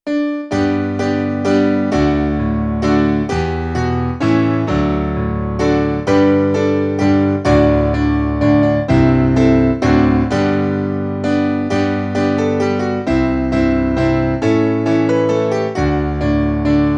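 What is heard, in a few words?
We Wish You a Merry Christmas Piano Accompaniment; LKY School Choir 梁季彜合唱團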